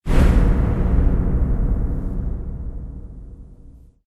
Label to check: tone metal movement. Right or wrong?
Right